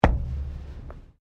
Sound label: METAL IMPACT THUD; Materials